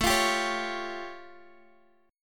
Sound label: A Augmented 7th A, Guitar Half Step Down